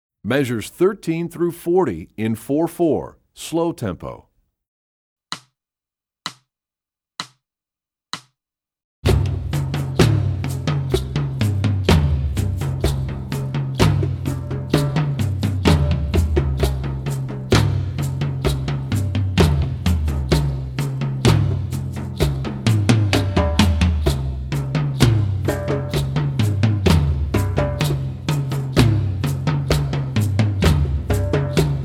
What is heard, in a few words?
Rhythm Studies, Percussion; EE2000 Book2